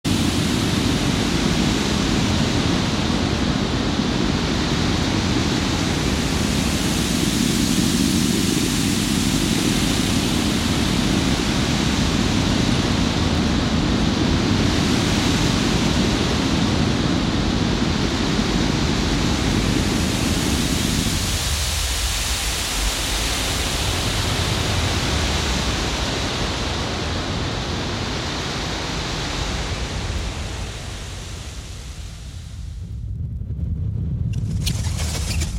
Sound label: Noise Electronic Music